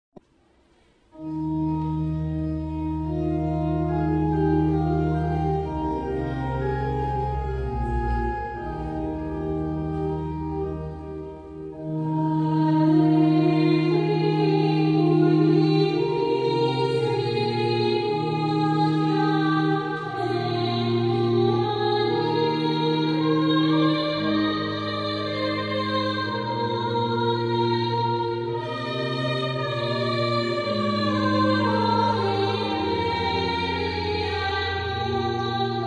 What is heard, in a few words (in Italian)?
Padre di misericordia bass